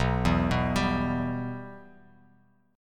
B Suspended 4th Sharp 5th B Bass Standard; Bsus4#5 arpeggiated; JGuitar

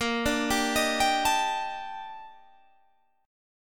Eb Major Sharp 11th Bb

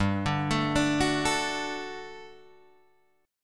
G Major 7th Suspended 2nd, Guitar, Open G; down

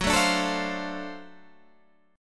D 7th Sharp 9th F sharp

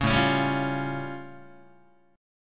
A Augmented 7th A Guitar; strummed; 4,5,4,5,5,4; JGuitar

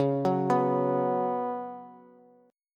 Db 7th Suspended 4th Db down Bass; JGuitar

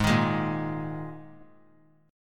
Ab Suspended 2nd; strummed; JGuitar

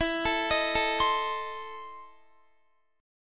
E Major 7th Suspended 4th Sharp 5th, Guitar

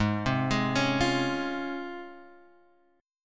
Db Major 7th Ab

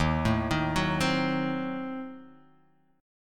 D sharp Diminished 7th; x,x,1,2,3,1,2,x; chordshape

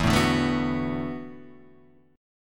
E 9th Suspended 4th E down; E9sus4 strummed; JGuitar

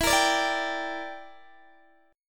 E Minor 7th Flat 5th E, Guitar Open D

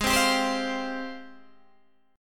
G sharp Major 13th, Guitar, DADGAD

